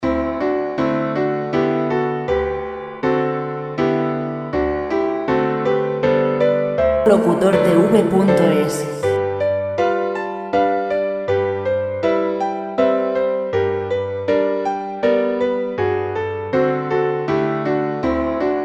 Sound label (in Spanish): Brahms vals 80 classic; Selección de grandes temas musicales de compositores clásicos